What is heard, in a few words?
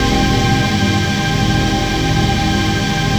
PAD, Dance Mega Synth